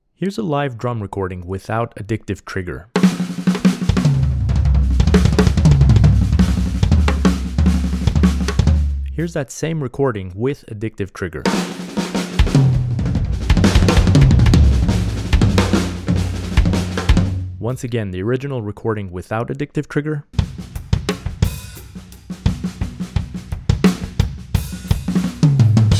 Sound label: more drums